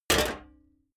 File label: sheet clank damped